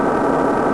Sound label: thrust; vectrex; vectroids